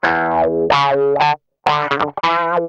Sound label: GUI 90 LICKS